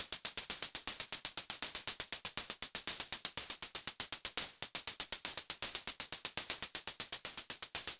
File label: Anabeat Hat; Beats; Sonic Foundry (Sony Creative Software); Prototechno